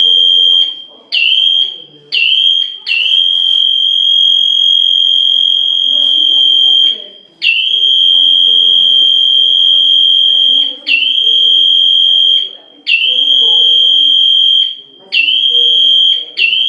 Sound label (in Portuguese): o alarme disparou de novo